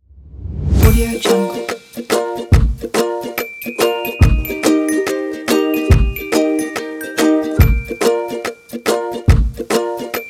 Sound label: happy kids; audiogreen